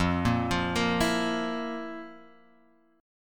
F Major 7th Suspended 4th F; FM7sus4 Chord {1 1 3 3 X 0} arpeggiated

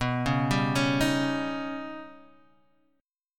D sharp Augmented 7th B, guitar

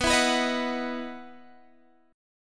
E Major 7th Suspended 2nd

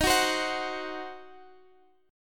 Eb Minor 6th, down, Guitar, Standard; Ebm6 strummed; JGuitar

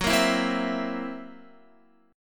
F sharp 7th Flat 5th Guitar, F#7b5 chord; strummed; JGuitar

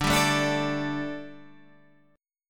D Suspended 4th D, down, Guitar Standard; strummed

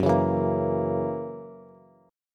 F sharp 7th, Bass; strummed; JGuitar

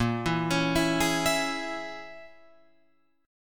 A sharp Minor 7th Flat 5th, Guitar, Open F; 10,11,13,11,11,11

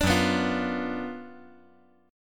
Gb Major 7th Suspended 4th Sharp 5th

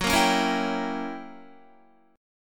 Gb 7th Flat 9th Gb Guitar; strummed; JGuitar

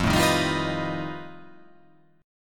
F 9th Flat 5th F down

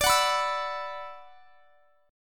D 6th Add 9th D; D6add9 strummed; x,x,24,23,24,24

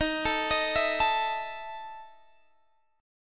E Major 7th Suspended 4th Eb, chord

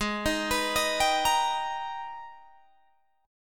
Minor Major 9th, Guitar, Open G; Gsharp

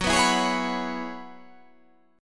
F sharp 9th Suspended 4th F sharp, chord

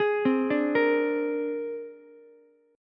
C 7th Suspended 2nd Sharp 5th, C, down, Ukulele; arpeggiated; chord; C7sus2#5

